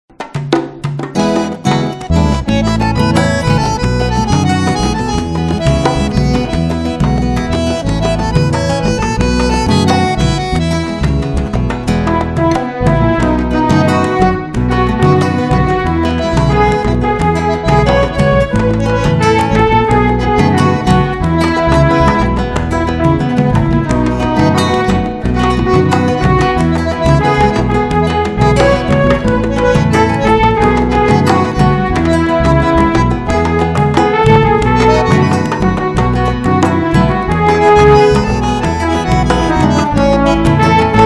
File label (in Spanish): Pista con melodia instrumental